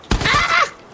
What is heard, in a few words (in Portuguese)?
gralha